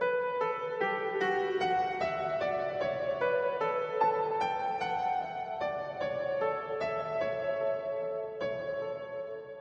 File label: Piano Temp Layer